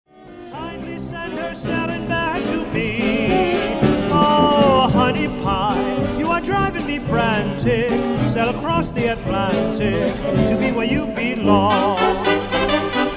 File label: vocal